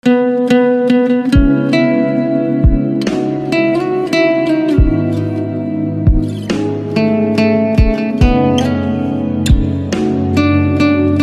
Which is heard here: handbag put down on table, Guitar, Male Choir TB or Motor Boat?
Guitar